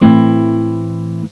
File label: acoustic guitar, string instruments; Sound Effects for Multimedia - Volume 1